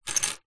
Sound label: door keys small bunch plastic fob pick up from table; zapsplat; foley